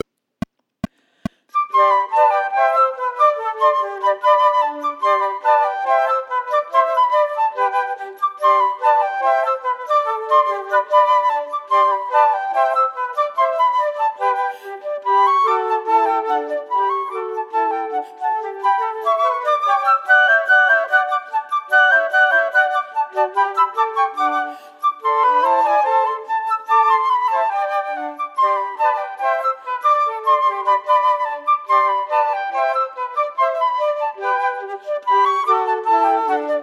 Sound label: Allegro Assai Quartet minus 2nd part; Haydn